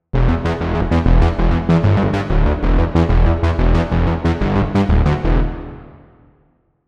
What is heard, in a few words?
unison brass bass; opxpro3